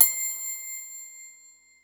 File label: mallets